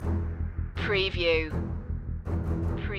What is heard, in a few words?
savage future loop